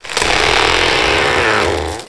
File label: tree; Mafie 1